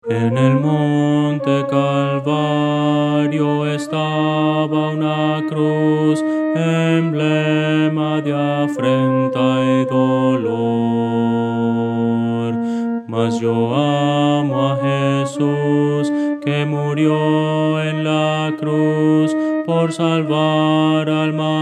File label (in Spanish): contralto, himnos cristianos; Voces para coro